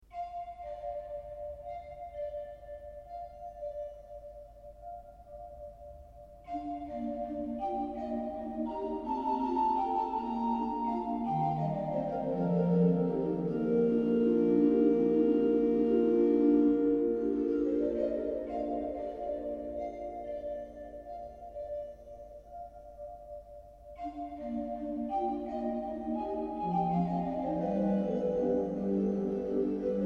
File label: Cuckoo, organ; M.Reckling